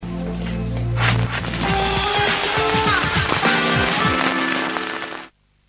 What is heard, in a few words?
Jingle Clash